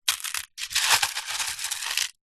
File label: foley stones very small rummage movement in tin